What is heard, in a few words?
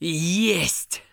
Emote Cheer; Twitch Skijump